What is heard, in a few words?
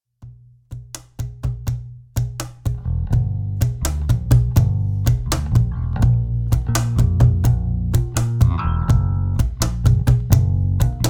violeta dub